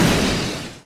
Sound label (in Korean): [Hit] 물 떨어저유; 音MAD; 少女终末旅行; 소녀 종말 여행 음원팩